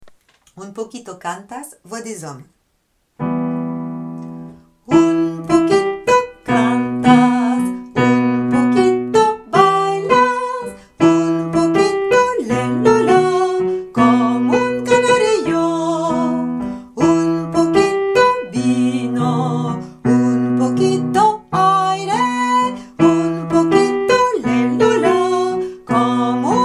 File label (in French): voix hommes